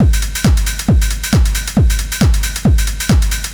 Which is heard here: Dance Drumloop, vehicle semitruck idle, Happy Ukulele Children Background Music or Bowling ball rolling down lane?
Dance Drumloop